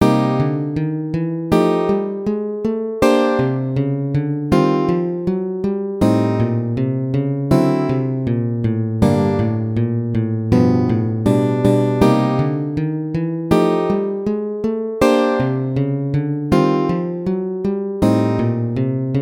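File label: running bass with chords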